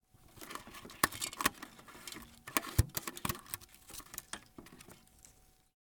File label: Tape Machine, Placing tape reels; sound ex machina